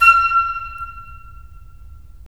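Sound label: FL, flute; LA Composer vol. 3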